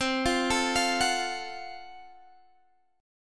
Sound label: F sharp Minor Major 7th Flat 5th C